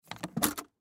Case Unlock Luggage; Latches; Foley

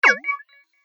Multimedia Digital Bleeps; Beep Sound Effects; Business Programs, Apps And Computing Noises

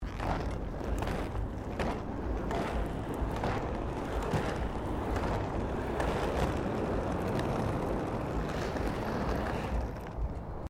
Rollerblading bitumen sequence

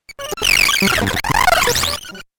Crazy Glitch 8Bit Style; Video Game Sound Effects

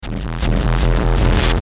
Distortion Electrical Pulses Buzz; Blastwave

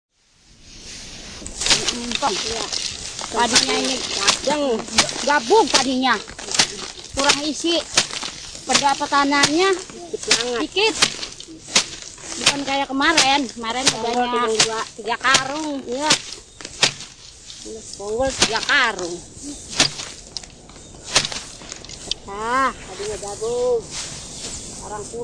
Harvest Women; Harvest women 1: Farmer woman working, discharging rice from rice straw. Occasional talking.; Environmental Sound Effects

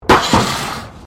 METAL BIN SCRAPE CONCRETE; Metal (Sound Effects:Materials)

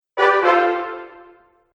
Fanfare; Musical

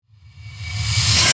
Suction Metallic, Suction, Metallic, Bright; Production Element, Imaging Element, Accent, Transition; Warped; BlastWave

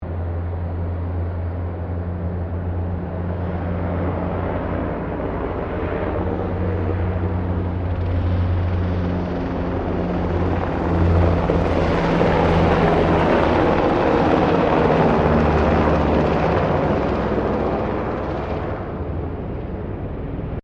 Helicopter Eurocopter AS350 Squirrel Passing; Various commercial helicopters

